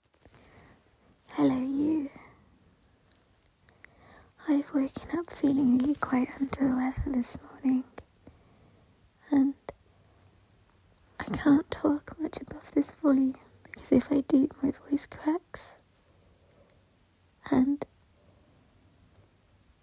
quiet as a mouse, ramble, moans, sighs, heavy breathing; F4M; all fap